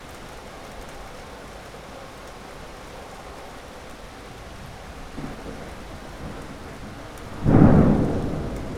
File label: rain and thunderstorm sounds